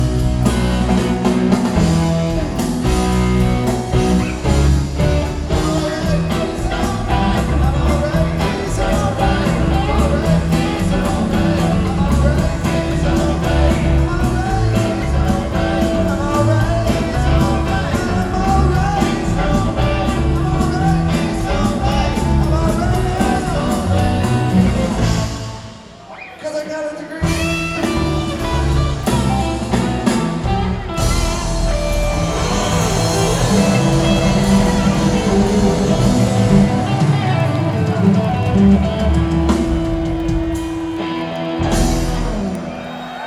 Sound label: Huntsville, AL - Orion Amphitheater